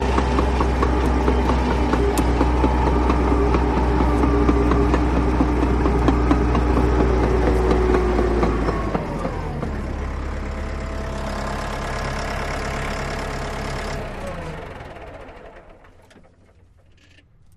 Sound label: Bulldozer In Stop Medium Slow